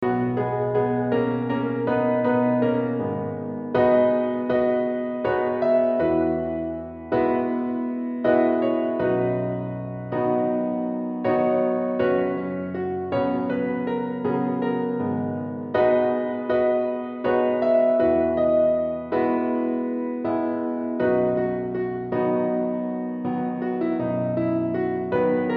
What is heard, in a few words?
Accompaniment Track; Audition; Piano Only